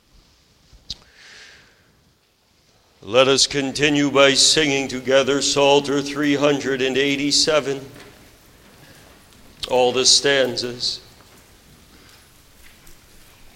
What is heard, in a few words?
Singing; The Strong Man Overcome; luke 1121-22